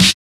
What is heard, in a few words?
Snares